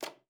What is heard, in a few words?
A3, alto sax; Xsample VOL-2